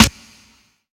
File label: verbic snr; Gangsta Sound Kit